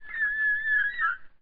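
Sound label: Special Photo Barcode Forest Environment Bird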